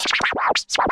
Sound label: remix